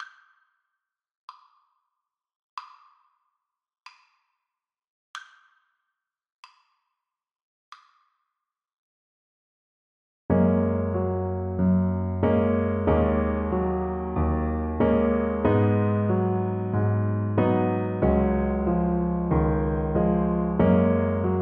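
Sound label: the singing bird alto sax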